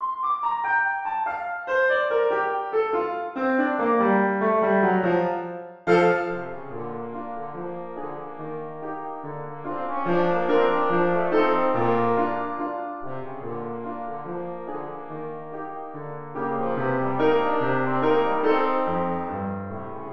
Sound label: the entertainer trombone